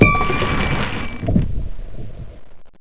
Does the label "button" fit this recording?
Yes